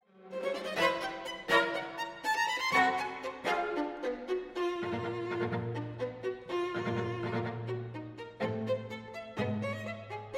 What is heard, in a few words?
Shosti Str Qt 8 3 cello concerto quote